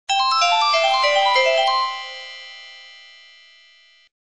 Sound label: vibraphone